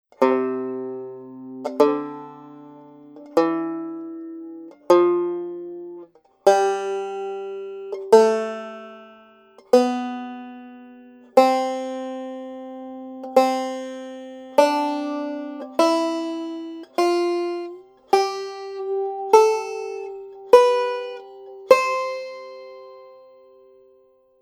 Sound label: C Scale